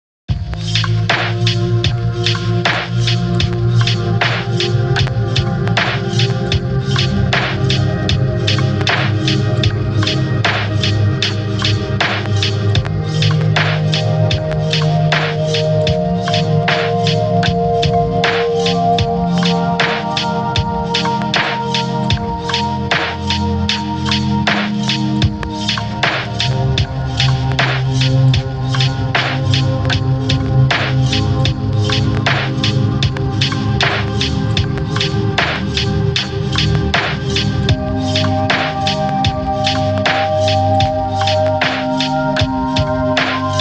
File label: Lofi, Chill Waves, Lofi Chill